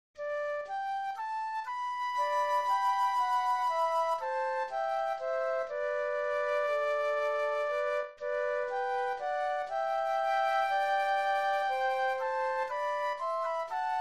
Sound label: The Water Is Wide Flute Duet